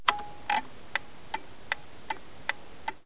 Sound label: Offroad Motorcycle Turn Signal; Outdoors & Nature